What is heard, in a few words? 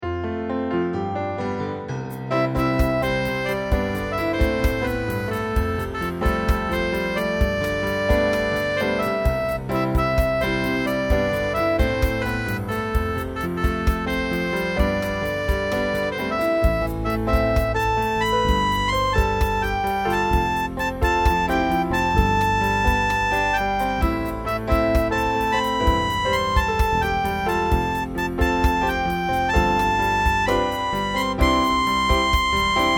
the head that once instrumental